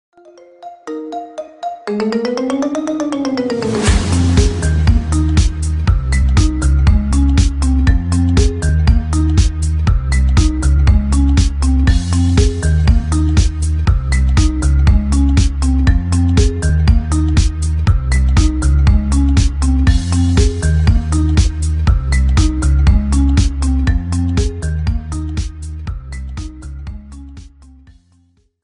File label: Marimba